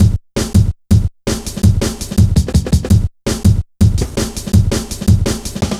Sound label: Hard Edged, Drum Loops, 165.5